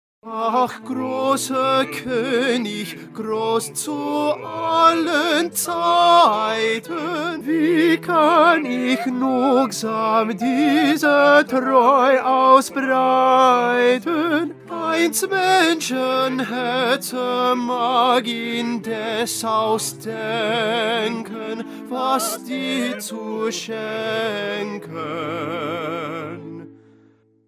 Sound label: Tenor; Bach; St John Passion